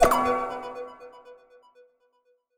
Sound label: switch power up